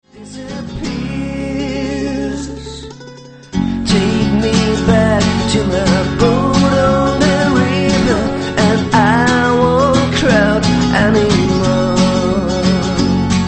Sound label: fingers